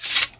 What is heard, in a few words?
spear; The Elder Scrolls III - Morrowind; Morrowind GOTY GOG